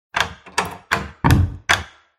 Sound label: door unlocks; scripted sequences; hideout